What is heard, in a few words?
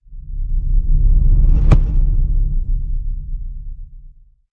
Hit Low Swelling Impact